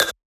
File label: Perc, Percussion; FLPRO